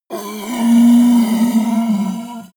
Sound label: yeti groan pain cry